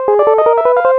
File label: ailment; mother2